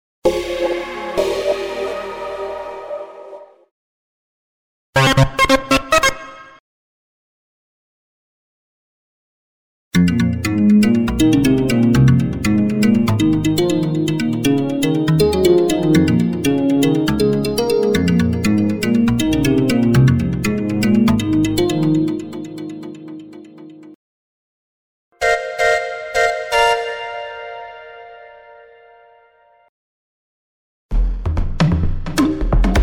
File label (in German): montage